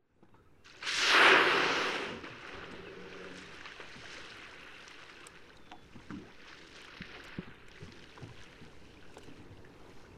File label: humpback whale spout parabolic mic